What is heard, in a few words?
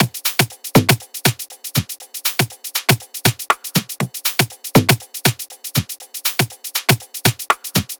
drumloop